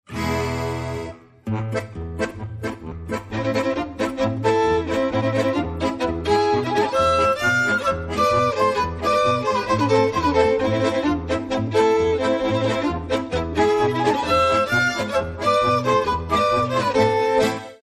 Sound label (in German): zigeuner marsch